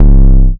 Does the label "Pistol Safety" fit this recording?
No